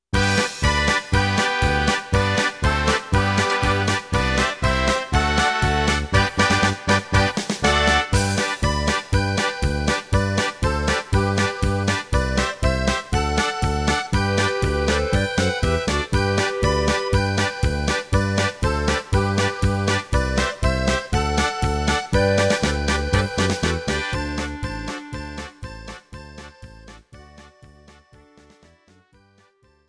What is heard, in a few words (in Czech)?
Polky, Breclavska